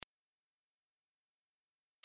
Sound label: 10khz